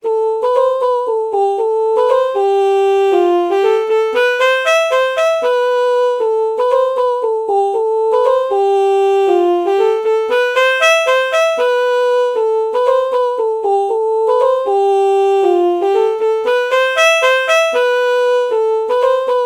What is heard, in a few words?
alto saxophone oh melody morph